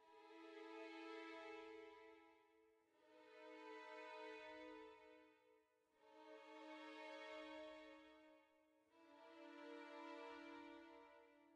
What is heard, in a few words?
dark soft start